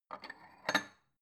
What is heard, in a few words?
bowl take soft